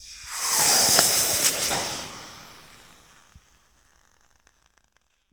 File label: Steam Sizzle Hit